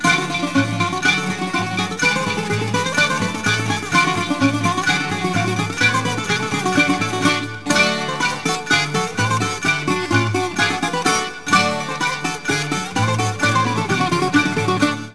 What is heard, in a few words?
greek flute; Aegean / Folk